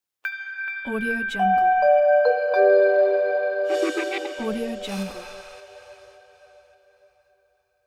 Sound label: intro ambient; teasound